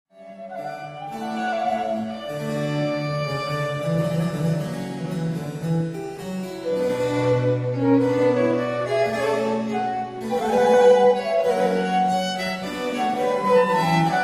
for 2 violins & continuo, Allemande; L'Espagnole (La visionnaire); Les Nations

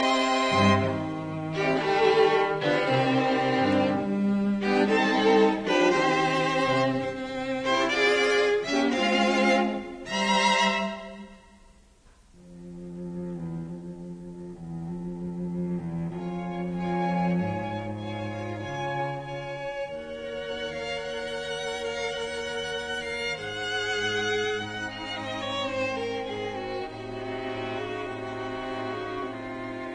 Adagio in C minor, Quartet; Mozart; Adagio and Fugue

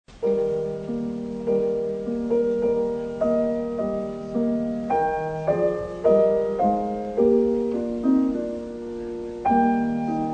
Piano Concerto in c, Larghetto

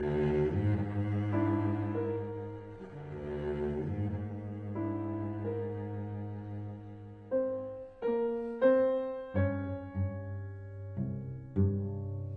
Cello Sonata, Langsam sehr Lebhaft; Hindemith; Op 11 No 3

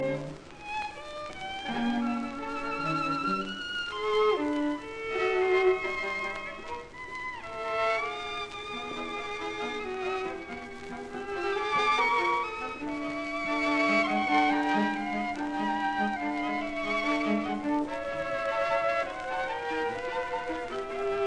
String Quartet Rondo Molto moderato; Schoenberg

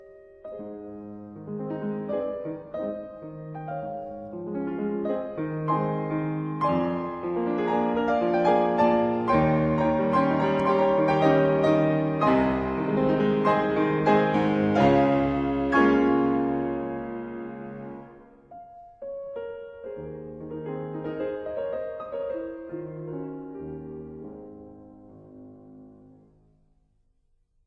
Improvisada Improvised; Cervantes; Juana Zayas

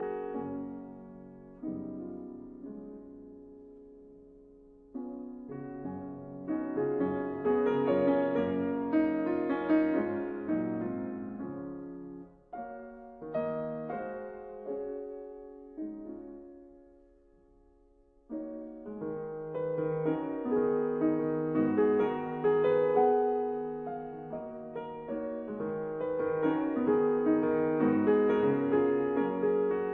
Mazurkas in F sharp major Allegretto; 9 Mazurkas Op 25 No 6

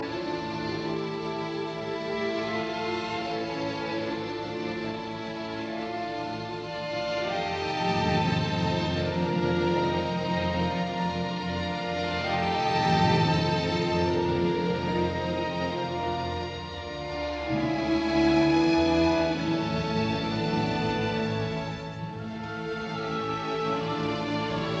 Symphony in D minor Ziemlich langsam; Schumann; Op 120; revised version 1851

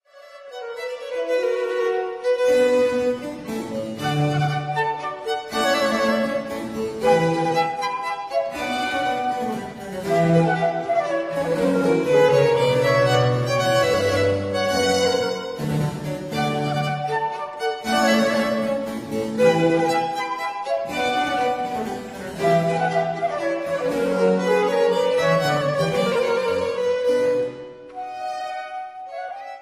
for 2 violins & continuo, Passacaille; L'Espagnole (La visionnaire); Les Nations

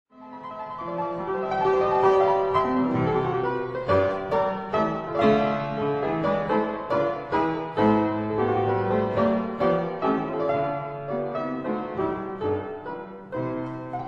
Keyboard Sonata in F major, Allegro con spirito; Op. 74

